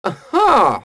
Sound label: humm; BattleSNDS